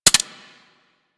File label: M16 Rifle Mech; Grineer